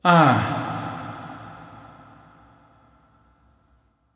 ahhh

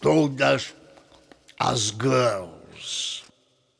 girls

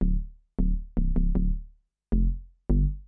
onda fone bass

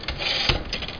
typewriter shift

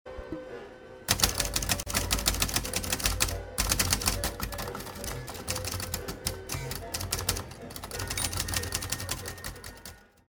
typewriter

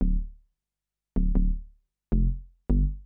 onda fone bass

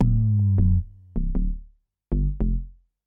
onda fone bass

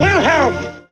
hall hall

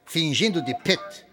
pitch

